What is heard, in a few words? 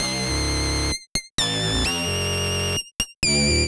Synths, Underground UK House & Electro